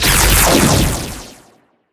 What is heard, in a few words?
laserring strong